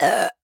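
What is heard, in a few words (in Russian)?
merzkaya otrizhka podrostka, teenager's gross burp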